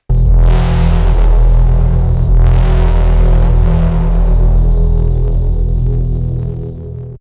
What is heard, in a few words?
Dist LFO Tones; neuro; BIG5AUDIO